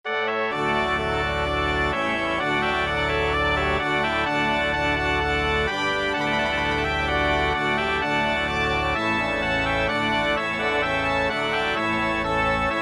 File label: organ and brass